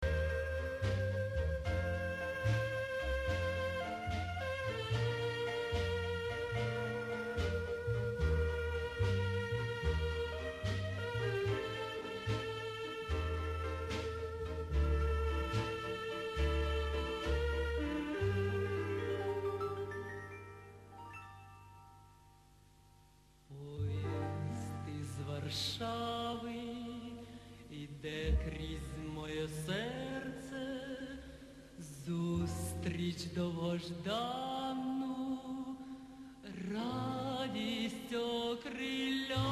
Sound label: Ukrainian tango; Volodymyr Vermenych